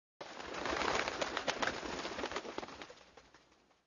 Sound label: flock birds; پرواز دسته جمعی پرندگان